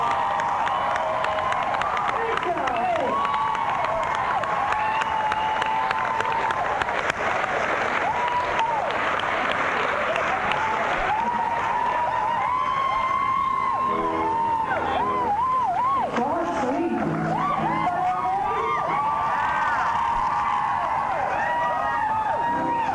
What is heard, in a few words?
crowd; adelaide, australia